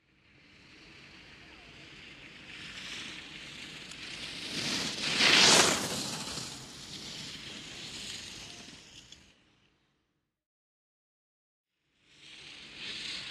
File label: Snowboard, snow